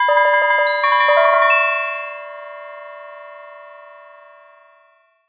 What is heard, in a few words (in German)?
dingeling